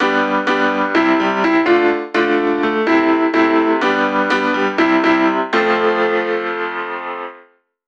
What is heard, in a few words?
Funky